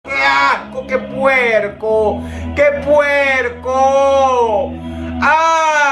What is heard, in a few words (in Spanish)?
el mejor grito de asco, grito de asco; dross